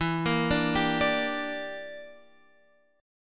E Minor 7th Flat 5th; chord shape

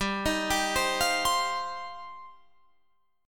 G 7th Suspended 4th Sharp 5th, Guitar Open F; Listen to G7sus4#5 arpeggiated; G7sus4#5 Chord

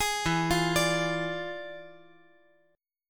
E 7th Flat 9th, chord

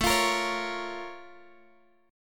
Bb Minor Major 7th, A

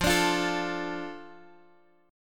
F 6th F Guitar DADGAD; 15,17,15,14,x,x; strummed

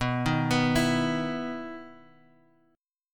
B Major Add 11th B, chord

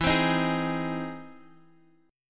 E Augmented Major 7th E, guitar; strummed

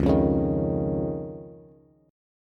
C sharp Major Add 9th, Bass; C#add9 strummed; JGuitar

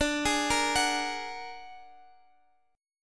Bb Augmented 7th D

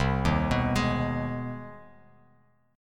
C Minor Major 7th Sharp 5th, Bass Standard; CmM7#5 arpeggiated; JGuitar

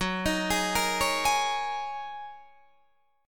F sharp 6th Add 9th Guitar Open D, chord

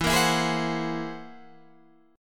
F sharp 9th Flat 5th E, chord

